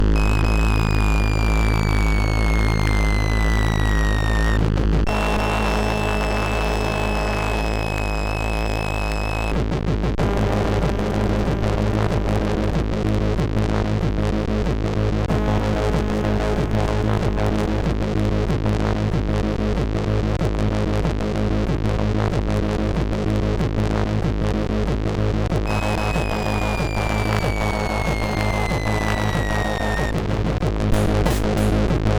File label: Monotone, sid MOS6581R3